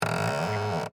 Creak Plastic, Texture, Creak, Plastic; Creaking; Objects; BlastWave

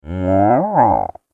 Inquisitive Dinosaur, dinosaur sound effects

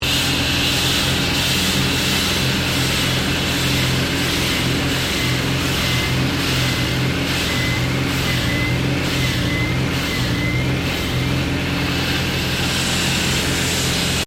Concrete Saw Cutting; Various machines; Saws

